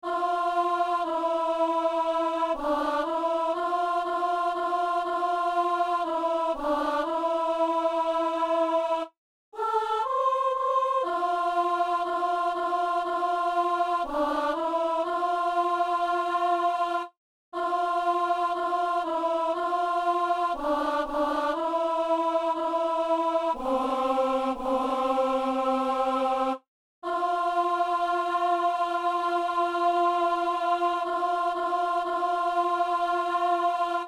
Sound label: alto